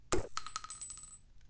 Shell 30 06 Winchester

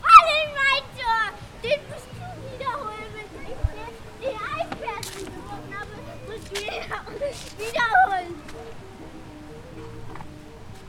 Child Playing On Playground Music Background

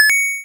Retro Coin

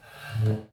Chair

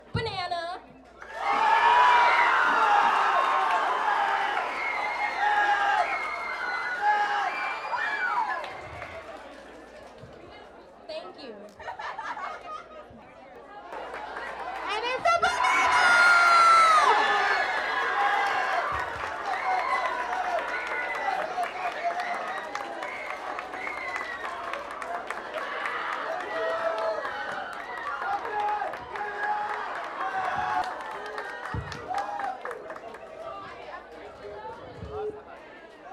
People Cheering For A Banana

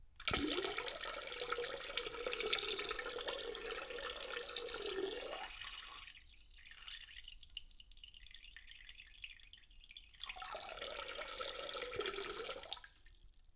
Full pee

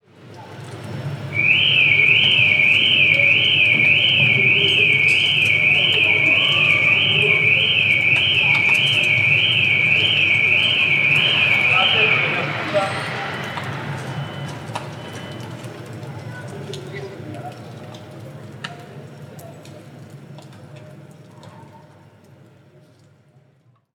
Car Alarm Roma

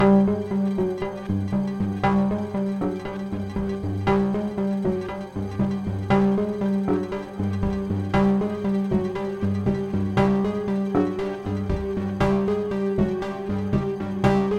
Turmoil

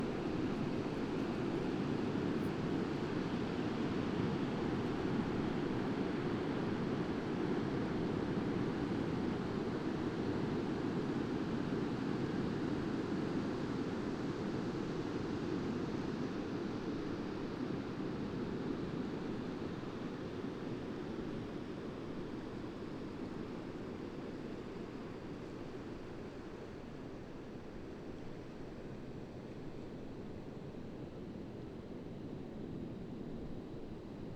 General Outside Ambience

